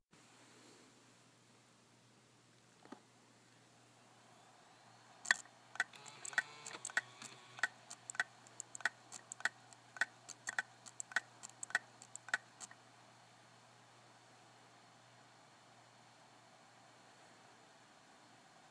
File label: laptop hard disk fail; 23sec, mono; laptop hard disk failure, 10 February 2015